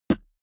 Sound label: beatbox snare punchy dry